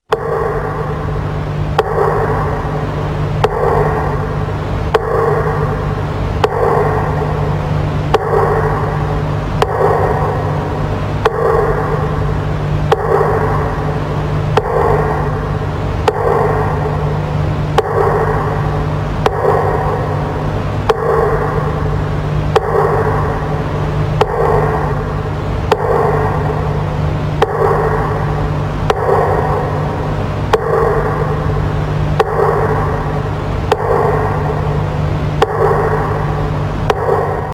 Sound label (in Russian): метроном; 1 world war